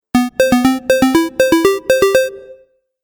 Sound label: Retro Game Melody; Video Game Sound Effects